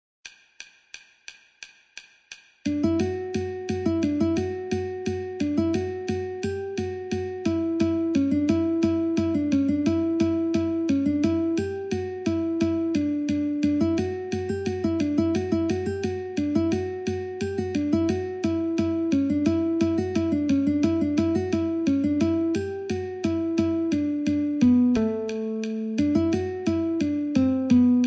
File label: Guitar